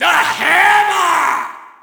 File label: Shout, audience; UFC Tapout 2